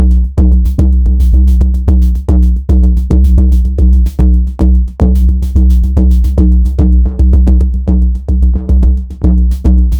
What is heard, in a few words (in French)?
dynamique electro avec de, electronic music